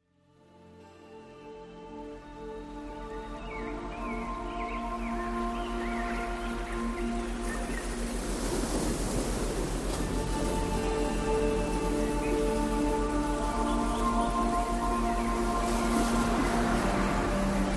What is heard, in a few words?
relax sea